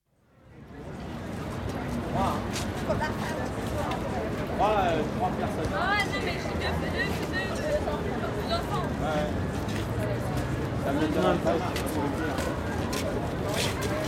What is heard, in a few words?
Crowds, Eiffel Tower, atmosphere of large international crowd waiting for lifts, with distant traffic; Crowds Exterior; BBC